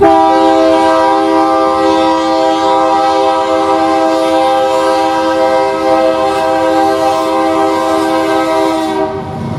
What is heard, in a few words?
horns; bnsf d9 k3la; run8 v3